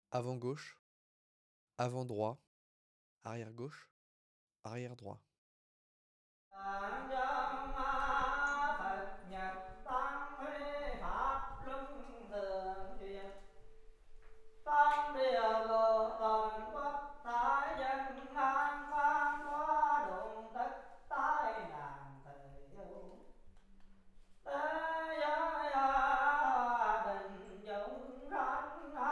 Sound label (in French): AMBIANCE MARSEILLE TEMPLE BOUDDHISTE MESSE CHANTS PRIERES PERCUSSIONS